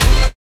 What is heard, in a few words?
Techno Hit, Roland BK-5; Loops & Phrases